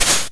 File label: hh shake; opl3; M8